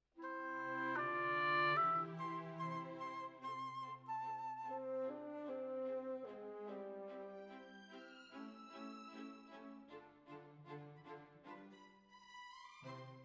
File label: Oboe